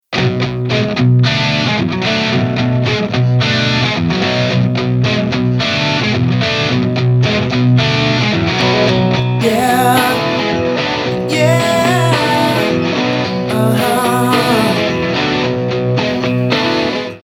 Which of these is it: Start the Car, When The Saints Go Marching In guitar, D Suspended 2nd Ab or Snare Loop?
Start the Car